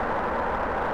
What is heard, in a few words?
Skid Road Light; LFS